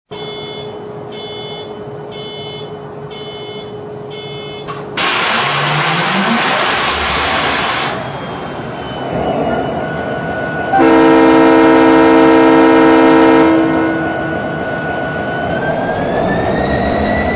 SD80MAC, electric trains

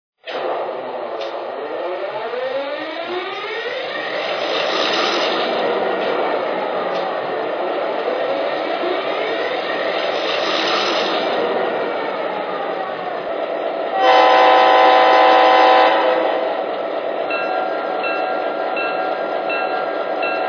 e8, electric trains

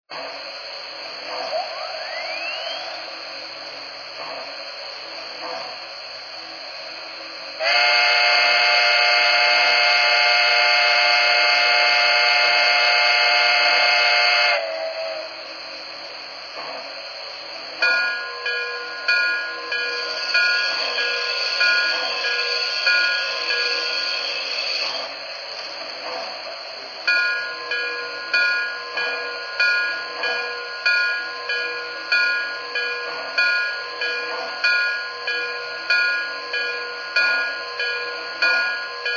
Mohawk

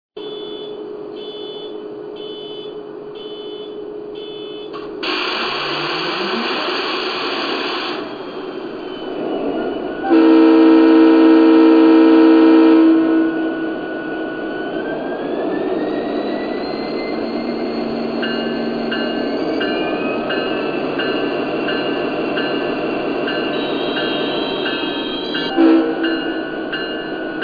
SD80MAC, electric trains

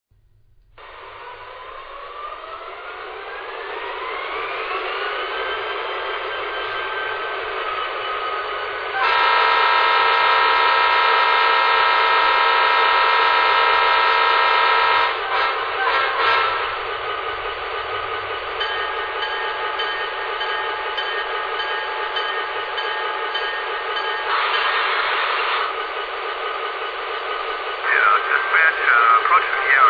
GP35, electric trains

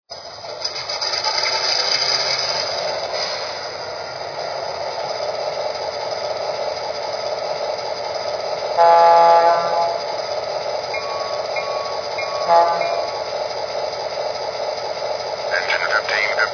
sw900 cab, electric trains

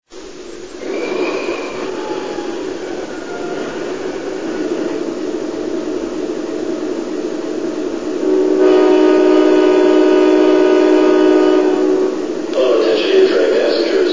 Genesis, electric trains

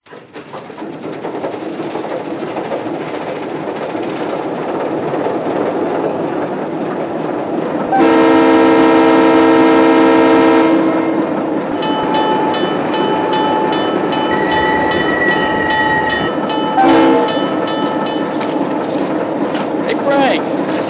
VO-1000

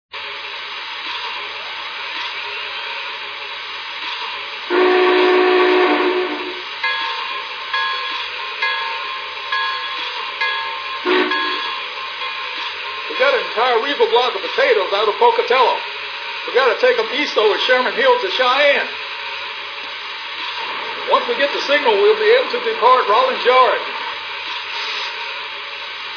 bigboy

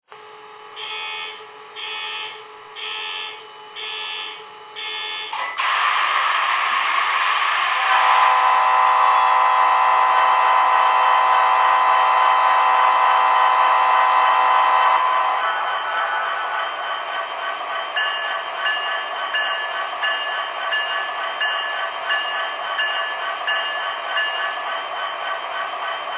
SD70ACe

